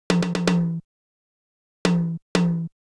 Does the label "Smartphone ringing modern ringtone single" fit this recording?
No